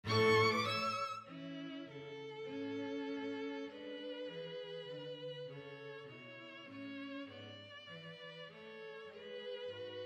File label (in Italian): Minuetto in la maggiore per 2 Violini e Basso